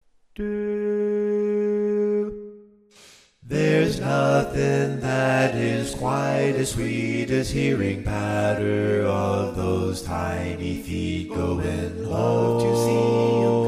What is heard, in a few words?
Bass